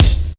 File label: short kick single shot D key, Royality free kick drum one shot tuned to the D note. Loudest frequency: 288Hz